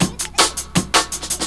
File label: break